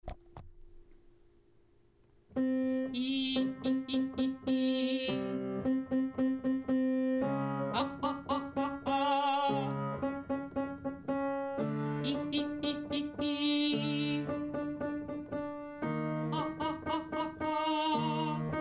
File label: Diaphraphgm pulse eeh ah